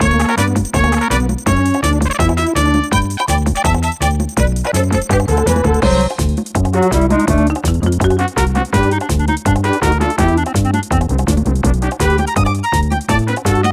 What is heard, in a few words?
Rocket Varient; lego racers